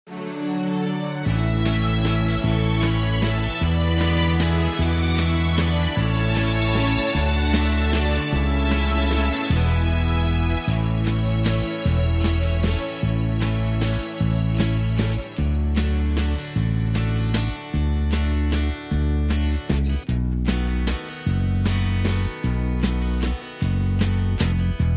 (A) No Backing Vocals

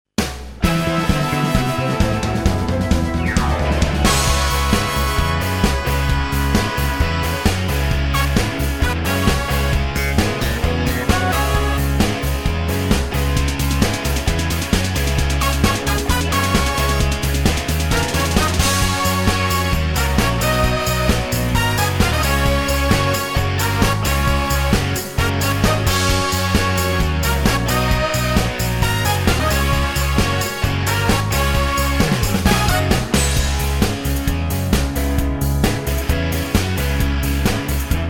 Disco Medley No Backing Vocals

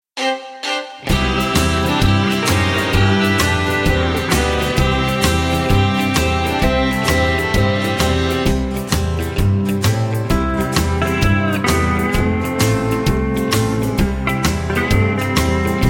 (C) No Backing Vocals

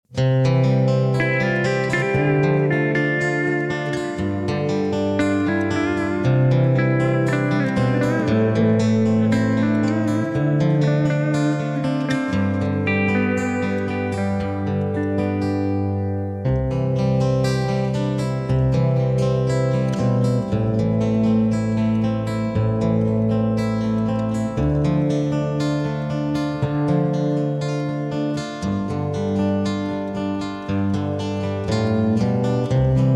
(C) No Backing Vocals

